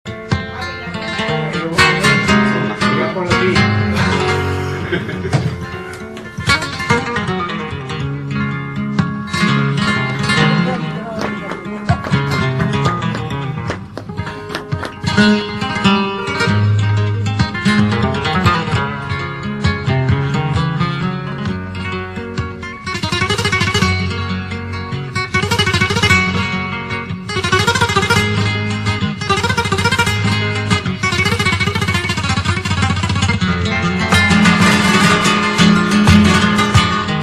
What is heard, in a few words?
solea